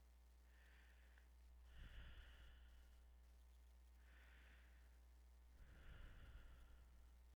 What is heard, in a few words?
Light Breathing